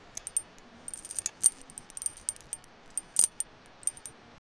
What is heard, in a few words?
tiny clicks and snaps of